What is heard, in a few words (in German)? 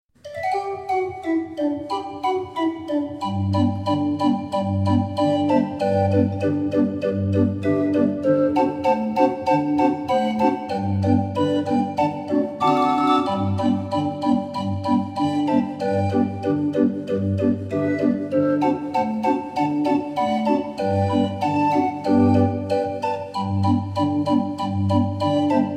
26er Drehorgel